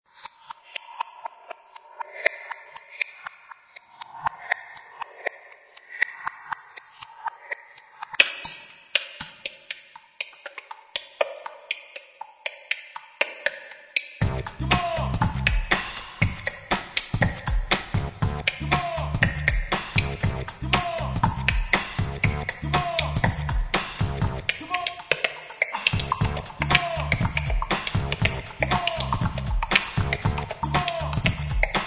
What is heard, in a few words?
Percussive persuasion